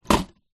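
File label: household medicine nicotine gum card box set down table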